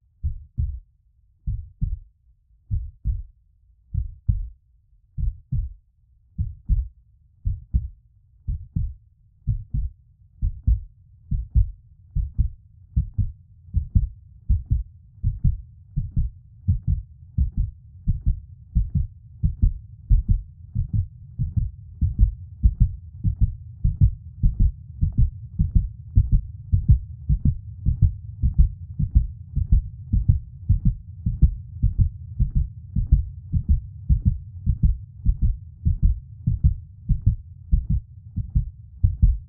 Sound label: Heart Beat Fright The Increase And Decrease In Heart Rate